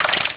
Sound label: ui camera shutter, Motorola ROKR EM35; ui sounds